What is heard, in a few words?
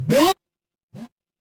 retro game jump